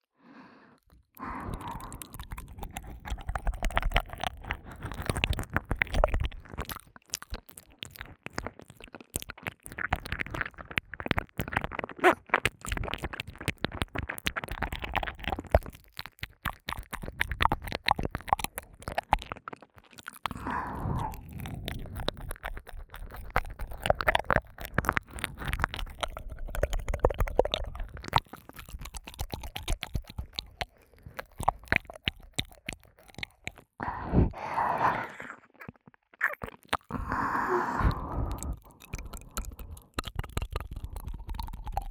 舔耳 不断挑逗; 仅仅是沉溺于舔舐声中; 舔舐声特化

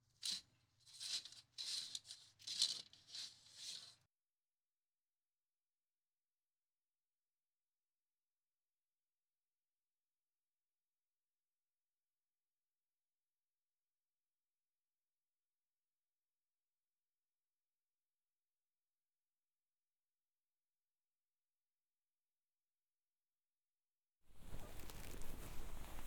連耳朵裡都要做按摩, ASMR; 桜木学園癒やし部～2年A組・佐々木絵空。文学少女の耳奥癒やし～; 耳かき・マッサージ

